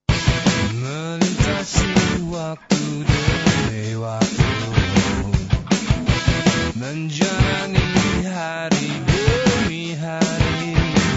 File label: jingle yamaha rock; ringtones